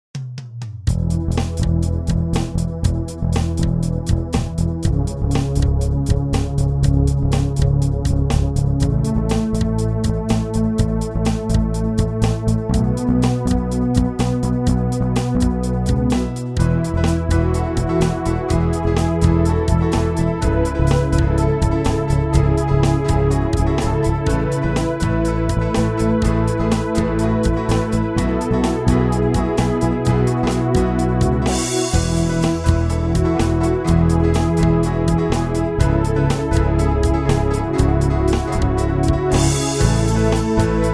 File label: MIDI ditty